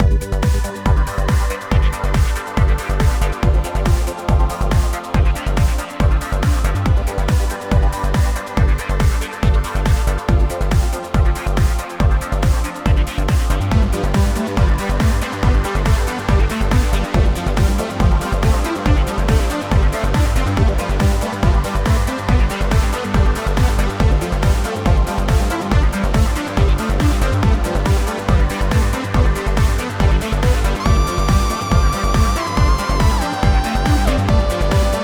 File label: trance; messiah